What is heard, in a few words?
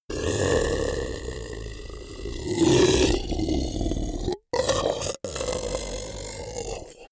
beast grunt warning angry; comedy-cartoon; soundpool